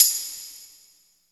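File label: TAMB, tambourine; East West - Phat & Phunky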